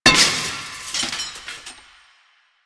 Chair Stack Destroy; Warframe; Ambience; Nineteen Ninety Nine

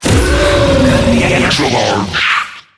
Death

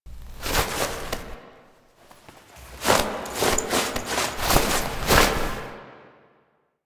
Foley C; Drifter Lotus End

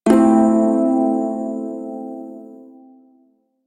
Prime Shawzin Maj Chord I; Music; Warframe

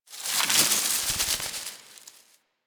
Creature Scurry; Ambience; One Offs; Warframe; Quests; The New War; Drifter Camp

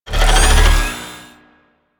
Mech Sword Heavy Charge; Nechro

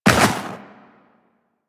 Walk Snow, Footsteps; Warframe; Tenno

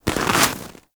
Armadillo Move Snow

